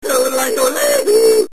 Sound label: Yodelai Yodelei Hee; video games; Street Fighter; Vega